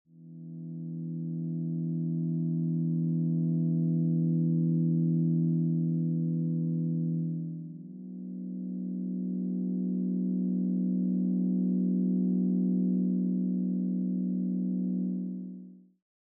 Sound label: Filters